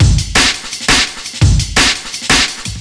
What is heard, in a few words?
dnb amen